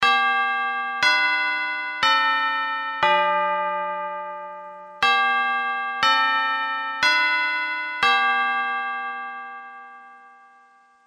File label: Westminster Classic Chimes